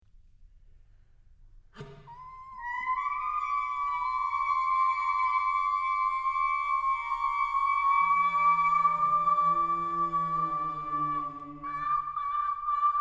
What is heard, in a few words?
monophonie